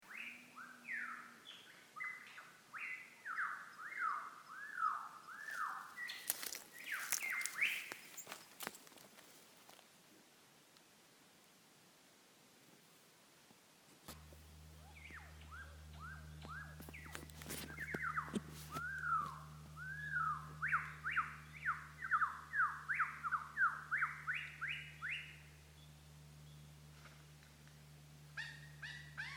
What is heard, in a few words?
lyrebird